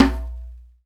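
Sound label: djembe open; Akai MPC2500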